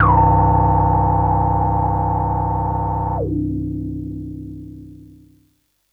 SYNTH

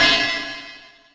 Ride Cymbal

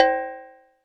Cowbell

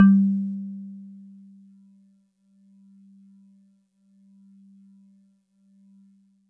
Celeste DX100 G-1; Pristine Celeste

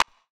Clap Small Verb

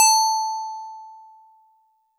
Metal Tube DX100 A6

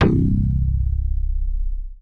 BASS DX7 Bass; Mega DX7; Snack

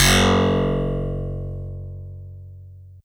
Harpsi FM DX100 Fs1

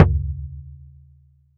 Tom

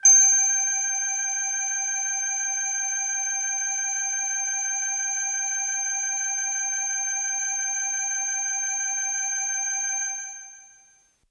Bell Air Kawaii G-1

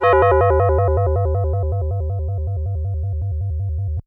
FM Bell FX DX100 F4

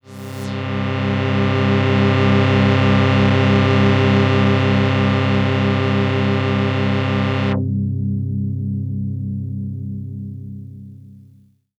SYNTH Medieval Drone

